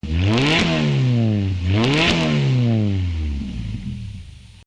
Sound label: gallardo